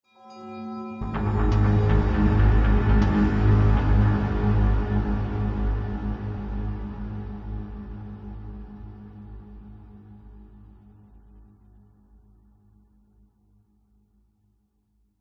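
cinematic hit bell chime into dark sinister dramatic bass arpeggio; sound design; zapsplat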